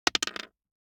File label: DICE on Hard Wood, Throw and Roll, Standard, 1 One Die; Samples; ArticulatedSounds; DICE Sfx Party (Extended)